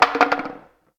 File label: Bone Hit